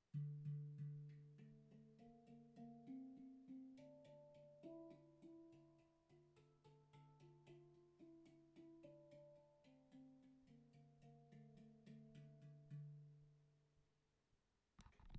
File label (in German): Meinl HD5 Tonleiter 6-8